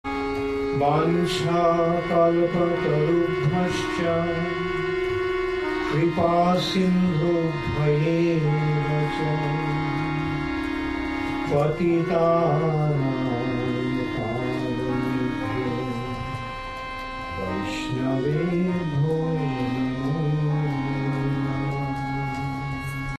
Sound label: Sri Vaishnava Pranama Sung; HH Bhakti Caru Swami; Pranam Mantras